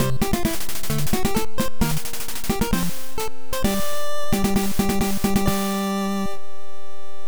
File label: Received Important Item; fire emblem ankoku ryu to hikari no tsurugi family computer